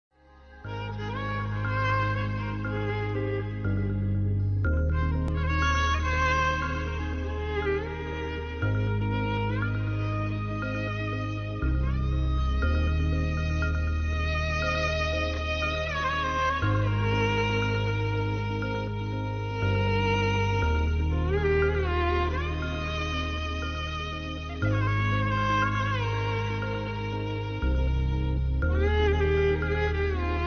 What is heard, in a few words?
sad ringtone; pelli choopulu movie